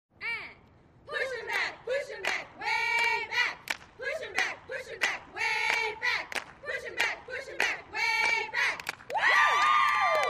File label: Cheerleaders Cheer